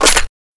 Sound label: dragunov reload clipin